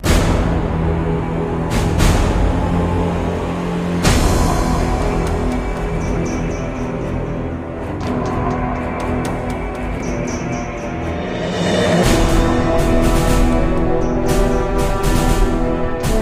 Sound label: Menu Music; Unreal Tournament 2003